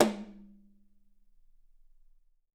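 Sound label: YAM 8X8 ROOM, Drums; Big Fish Audio; Ross Garfield Drums VOL-2; TUCSON KIT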